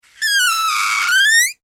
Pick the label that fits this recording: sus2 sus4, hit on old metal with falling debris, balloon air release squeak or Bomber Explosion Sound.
balloon air release squeak